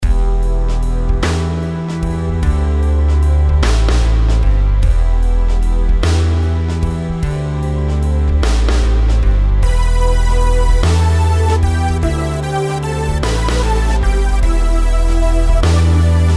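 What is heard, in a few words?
melodies compound 8s E minor; melody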